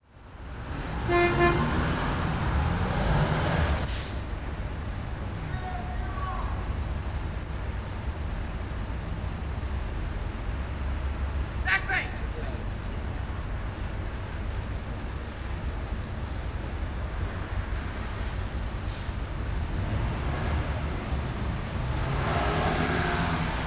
Traffic

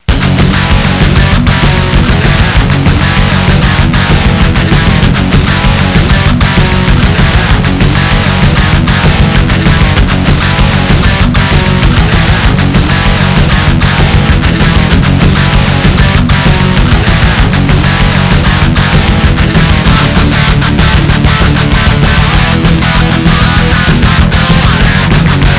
Race Music; roadwars

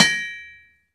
axe; kult

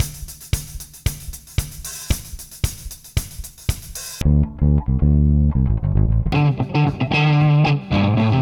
riff funk; Cakewalk

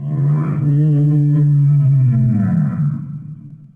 sea life; DAoC

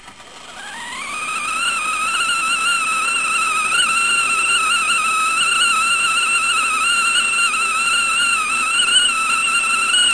Teapot Whistling

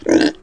PIG GRUNT; REDNECK; RAMPAGER

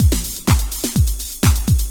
drum loop house 125; eJay

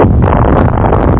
boom!; charley cat mouster mind

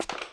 steps; allods2d

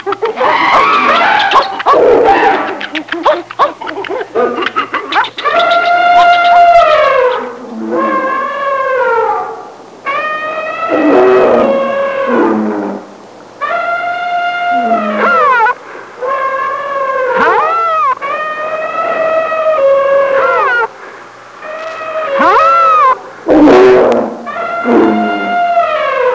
zoo, jungle

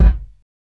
KICK, Ambient, Drum

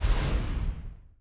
brick; rapanui

